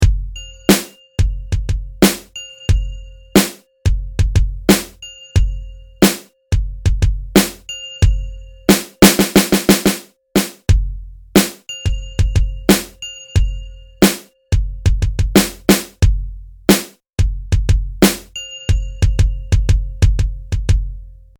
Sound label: drum loop of steel